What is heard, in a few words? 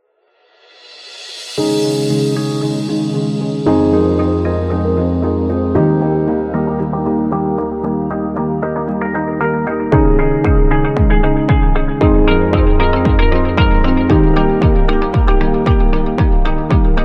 Luxury Lounge; business background